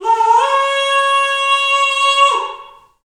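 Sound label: Wind Bullhorn, Afro Horns; Roland LCDP11 Africa VOL-1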